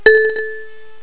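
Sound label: XYLOPHONE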